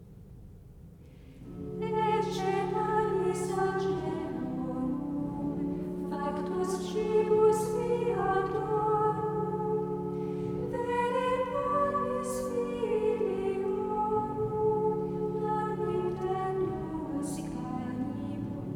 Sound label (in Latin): Ecce Panis Angelorum Coro; anno liturgico